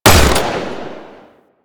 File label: Burst Rifle Fire; Weapons; Grineer